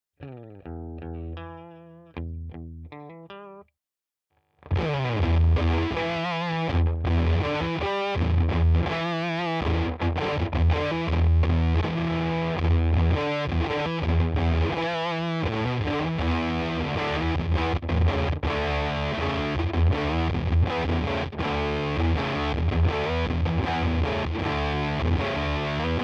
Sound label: Riff; Octa Hive white